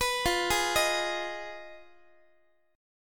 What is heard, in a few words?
F Minor 7th Flat 5th, Guitar; Fm7b5; arpeggiated; x,x,14,15,12,14